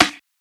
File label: snare; dough-samples; eddyflux